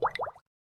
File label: ripple touchrelease, phonetones, ui; LG G Pro 2 D838